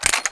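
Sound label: svd magazine out, weapons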